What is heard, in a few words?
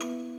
musicbox